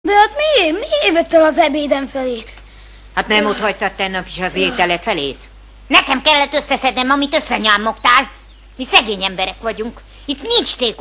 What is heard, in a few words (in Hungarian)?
Indul A Bakterhaz